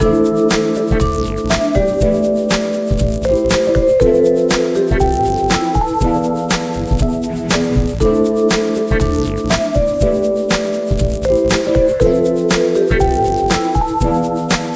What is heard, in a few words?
Music Bed; imagine interior designer 2008 nds